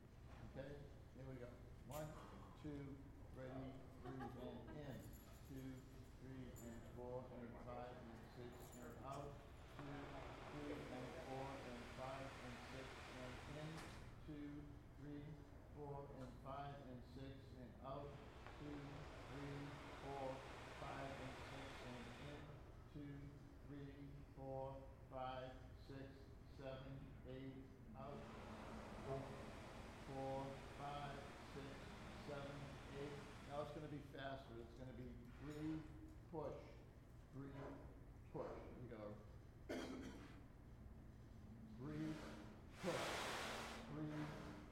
Breathing, brass; north star brass lynn ma

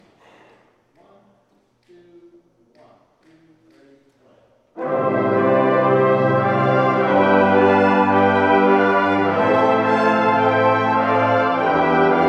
North Star Tuning Sequence, brass; brass simulation

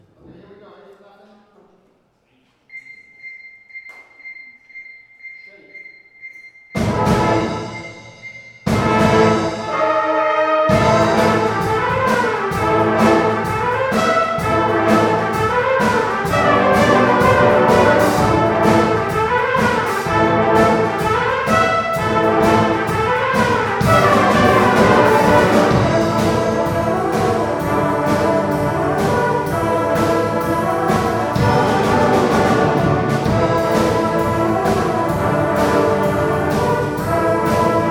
brass; north star brass woburn ma

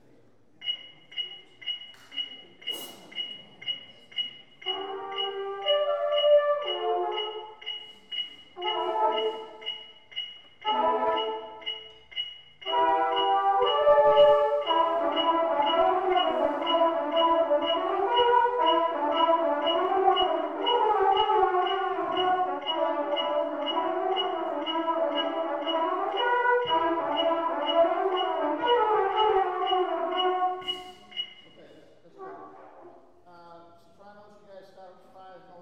Mellos; north star brass; woburn ma

